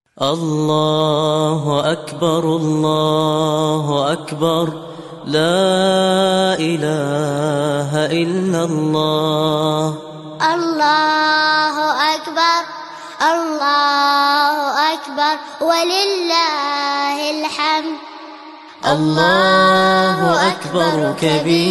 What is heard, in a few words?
takbir, تکبیر عید فطر